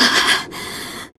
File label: Pant